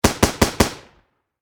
Warfare Machine Gun M240B Four Shots Close Perspective